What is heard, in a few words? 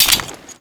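weapon foley anaconda reload chamber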